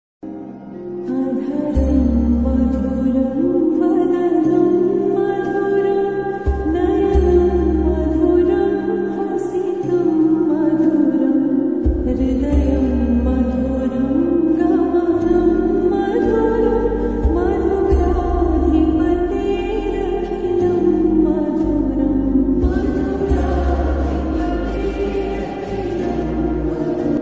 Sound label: lofi ringtone